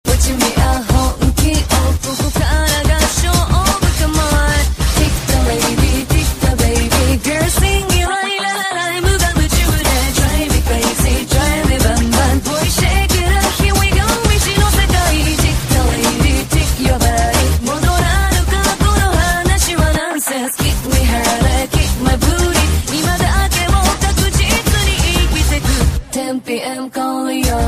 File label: J-pop